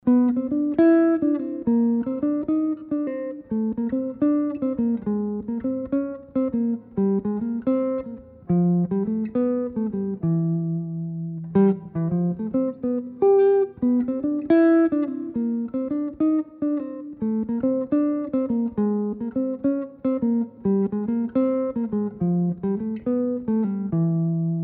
solo etude; jazz tunes

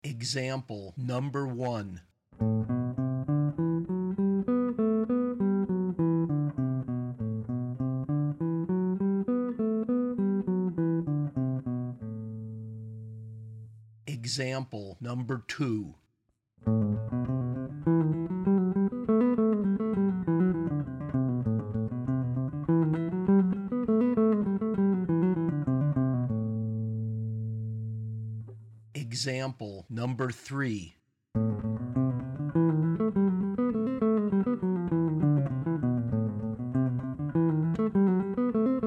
Arp Weighted Jazz Lines; lead skills materials

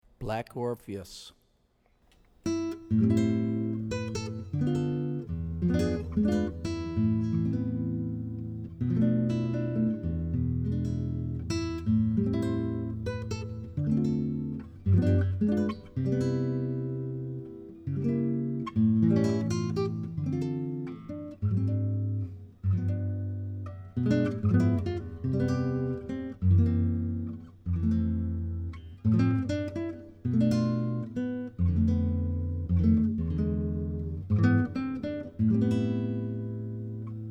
Chord Melody; jazz tunes

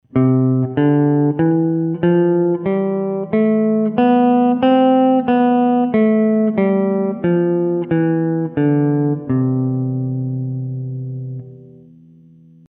C Scale Up Down, guitar; introductory guitar skills worksheets

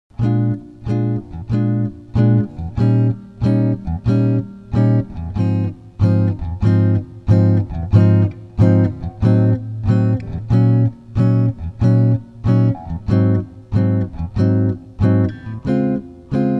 Simple Comp; jazz tunes

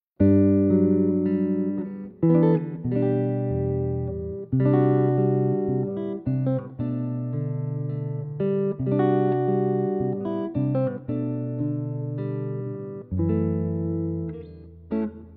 Chord Melody; jazz tunes

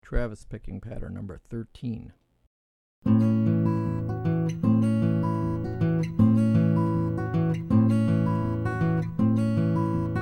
Travis Patterns; travis style lesson materials

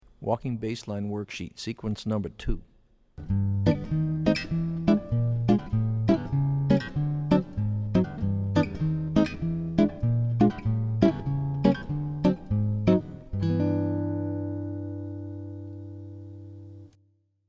Walking Bass Lines; jazz chording

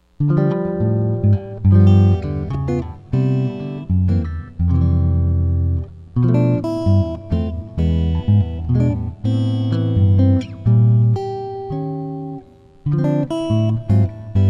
Chord Melody; jazz tunes

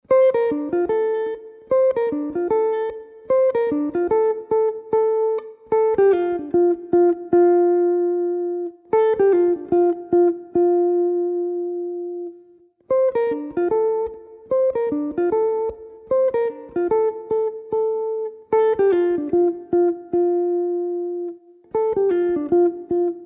Basic Melody, jazz tunes

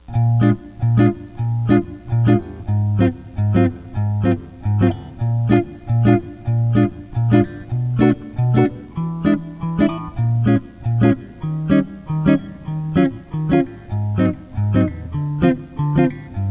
Solo Changes; jazz tunes

